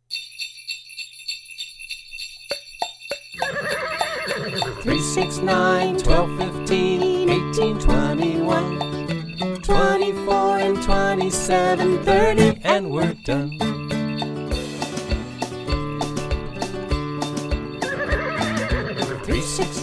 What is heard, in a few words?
Jingle Bells